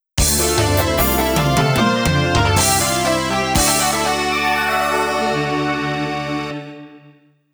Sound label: Stage Clear - Midi Roland SC-55 Version